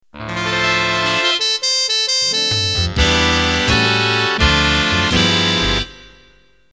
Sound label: Big Band Slow Intro